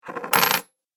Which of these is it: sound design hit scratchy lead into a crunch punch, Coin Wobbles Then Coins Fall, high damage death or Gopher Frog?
Coin Wobbles Then Coins Fall